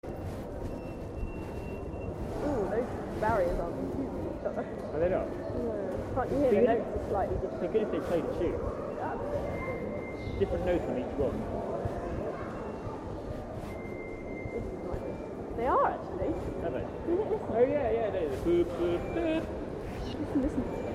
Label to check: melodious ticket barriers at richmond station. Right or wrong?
Right